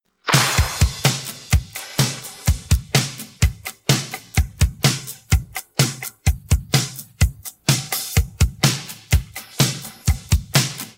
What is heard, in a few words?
drum